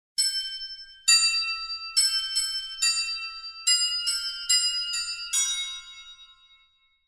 Giai dieu giang sinh vao mua dong

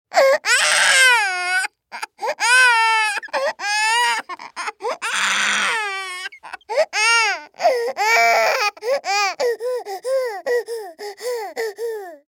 tieng tre con khoc sau khi bi danh

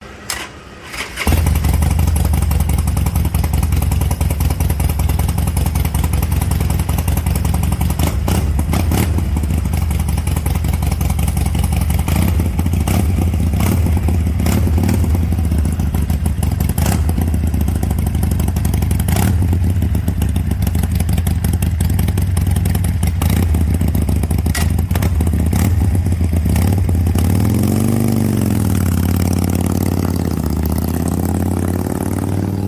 Tieng po xe harley davidson

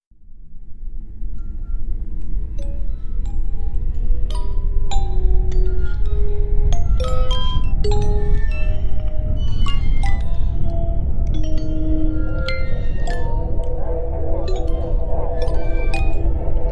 Tieng on ky la dang so kinh di

